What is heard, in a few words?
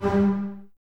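Basses G 3 Staccato; String Ensembles; Miroslav Vitous; 90 s Sample CDs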